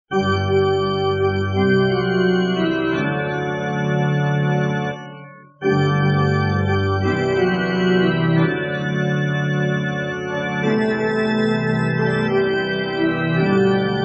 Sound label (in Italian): solo musica